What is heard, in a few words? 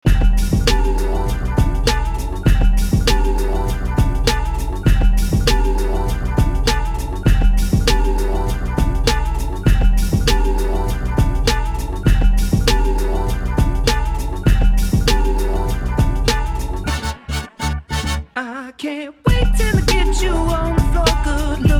funk; multitrax